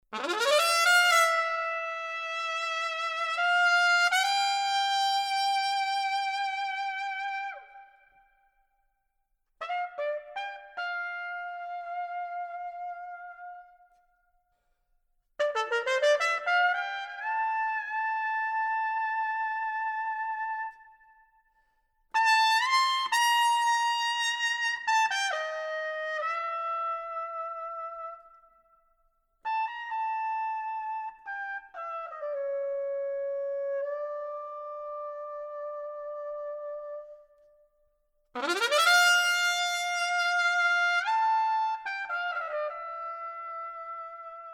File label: Trumpet Feature